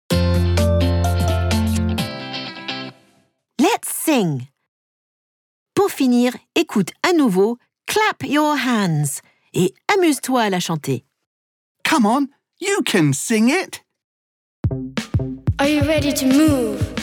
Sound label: Let's sing Clap your hands; I Love English for Kids